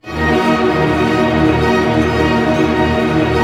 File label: STR ARP MI, Symphony Orchestra, STR Arpeggio